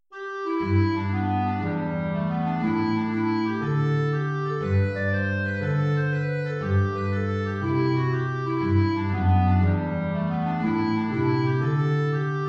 Hornpipe (Klarinette in Bb & Gitarre) (tief); The Belfast Hornpipe

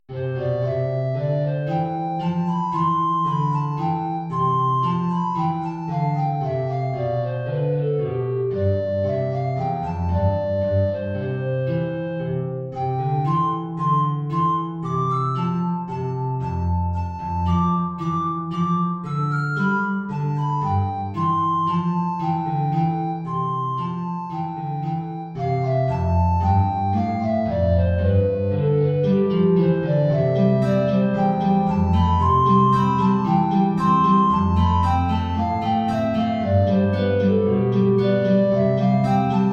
Air, Altflöte & Gitarre, tiefe Notierung; BWV 212-24

